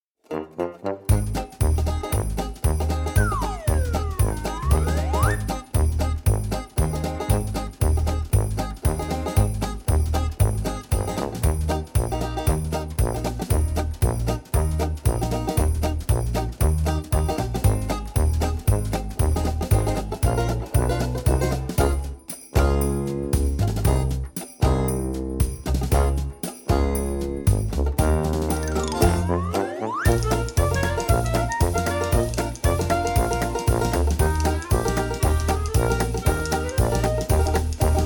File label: Walk On Music